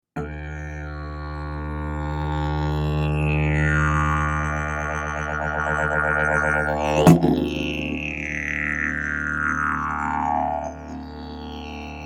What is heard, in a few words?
didgeridoo